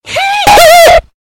hee hee

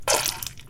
spit splat; mike koenig

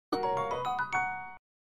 sfx item get; botw

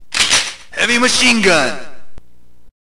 heavy machine gun; metal slug

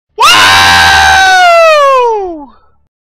scream wrooow

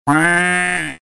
cuaaaaaack

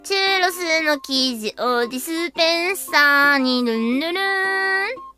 polka

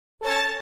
Drip Sound Effect; Goku

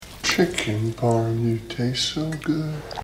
jingle; peyton manning

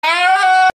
man scream; mirror man

